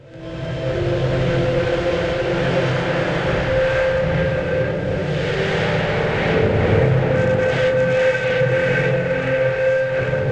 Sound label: Fairground, C3; Zero-G - Ghost In The Machine